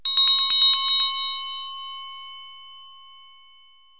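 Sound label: brass bell, bells; Kaboom! Junior; citylife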